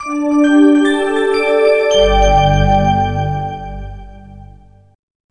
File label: Notifying Sound